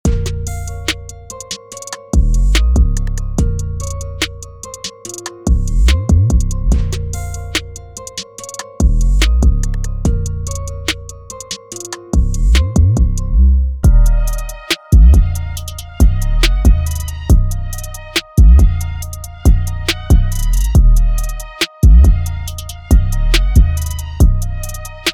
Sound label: Modern Trap Drum Loops